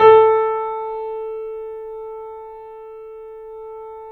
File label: A, The Piano; InVision Interactive - The Piano